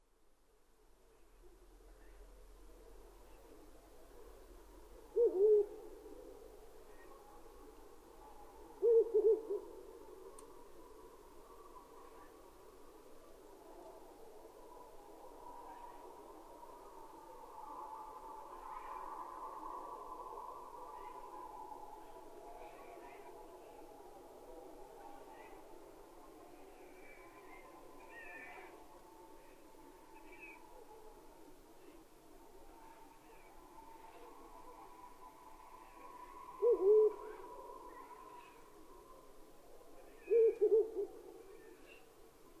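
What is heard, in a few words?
Ural owl; fukurou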